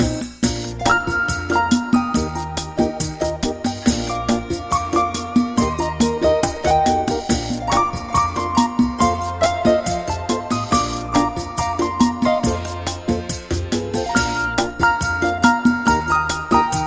Bossa Nova

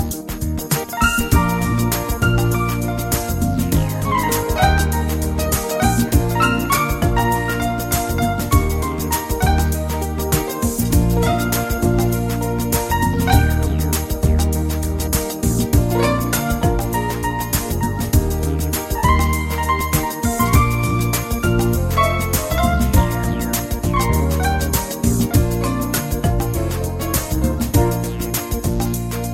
Soft 8 Beat

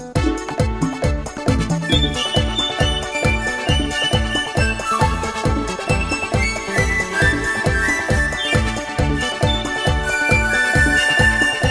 Classic Merengue

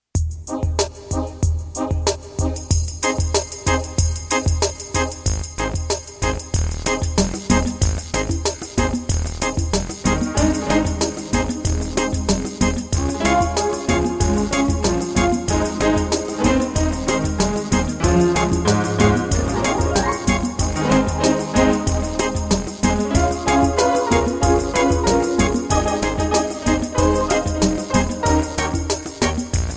Pop Reggae

